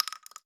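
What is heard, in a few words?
bullet case bounce shotgun cement close large; casings; gmod; viper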